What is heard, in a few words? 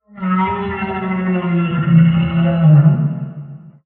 whale call; boss